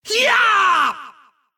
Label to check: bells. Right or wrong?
Wrong